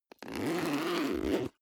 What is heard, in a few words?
zipper backpack open